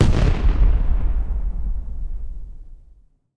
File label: far, explosion